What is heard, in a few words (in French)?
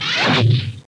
cracheur; Arc En Ciel Et Le Lagon Merveilleux; French